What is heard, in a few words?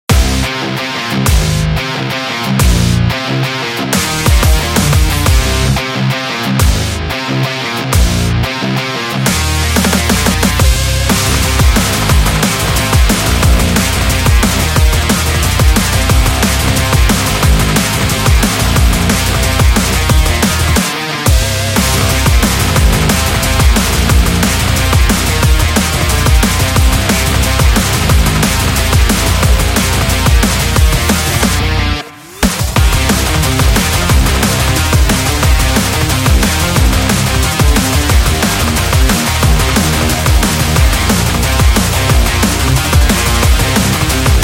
Damage, metal